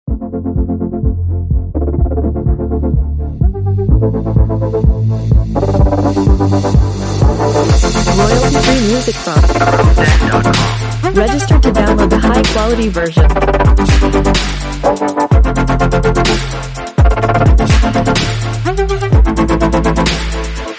Speed Energy, Fluttering synthesizer and deep 808 bass combine to create a modern electronica